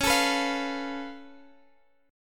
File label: C sharp Augmented 7th, C sharp